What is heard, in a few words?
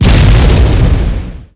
Small Exp, Explosions